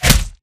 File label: bullet light ballistic flesh; Titanfall 2